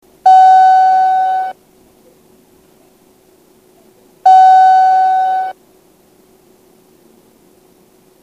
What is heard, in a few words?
door